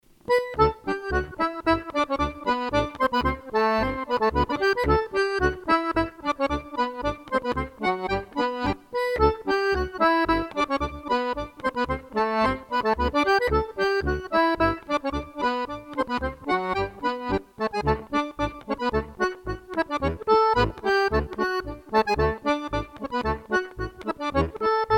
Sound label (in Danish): Rejnlaender Polka